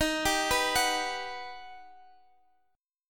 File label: G Augmented Major 7th